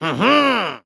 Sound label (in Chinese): 卫道士庆祝声